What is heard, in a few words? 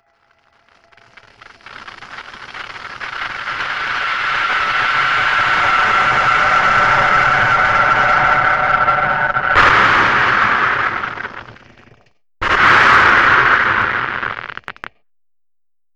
horror noise; 主播女孩重度依赖